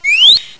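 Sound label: SINGLE, BELLS WHISTLES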